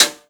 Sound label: Rim Rimshot; K27 Trash